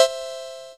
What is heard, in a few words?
blipz, Synths; Notes